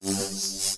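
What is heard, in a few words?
ambience; zaero; world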